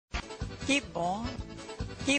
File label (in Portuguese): vinheta; panico na tv